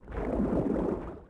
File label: swim stroke; DAoC